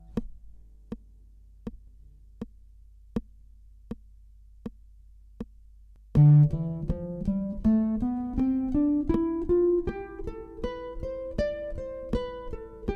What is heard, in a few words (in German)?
Tonleiter achtel dorisch, gitarre